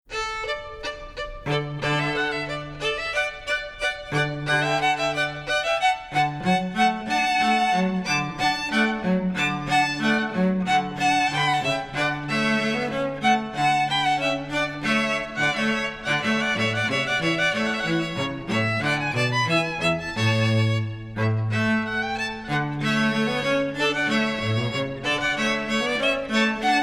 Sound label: trio; la rejouissance